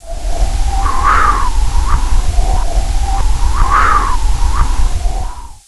wind; FRANK

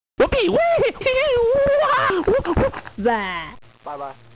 WHOOPEE

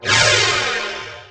TELEPORT

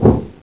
flak

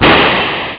Explosion; BoulderDдsh; ClassicC64

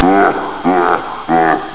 HEHEHEH

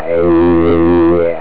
Teleport

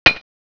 clink

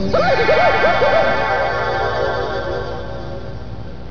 BEAM

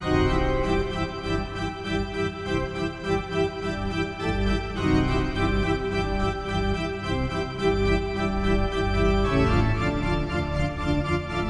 ORGAN

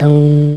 DOOOH, techno; cubase

